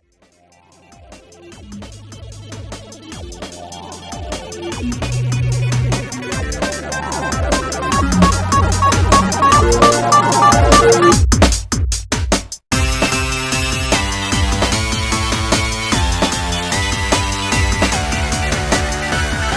Drum And Bass

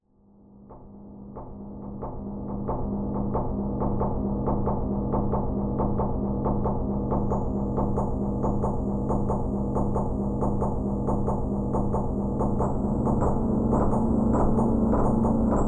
a selection of textures and drums; Pony Music Archive

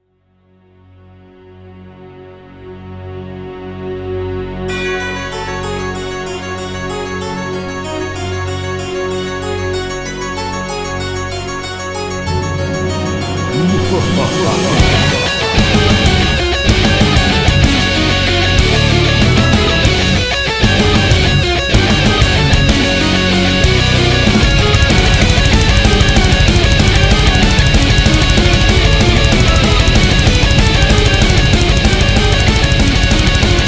Metal version, Eurobeat cover

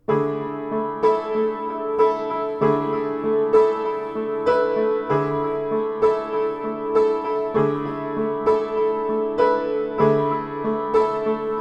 Instrumental in F# minor

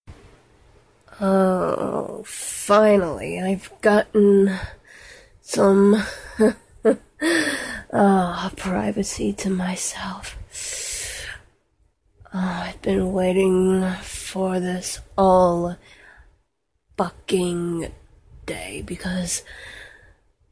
Whimpers, Squeaks, Screaming orgasms; F4A; Make Some Noise With Me

